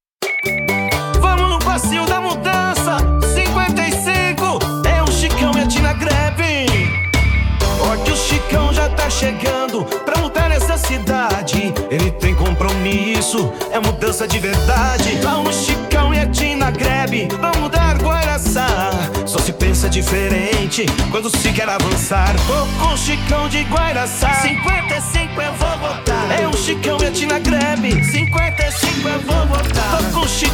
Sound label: Jingle